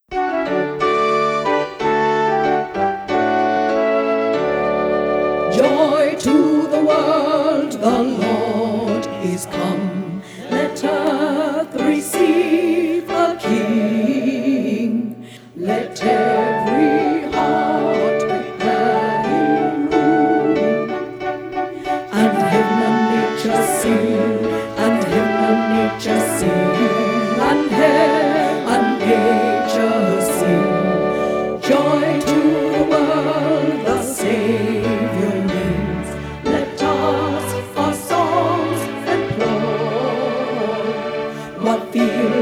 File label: tenor joy to the world